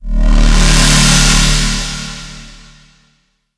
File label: teleport; k8-i-v-a-n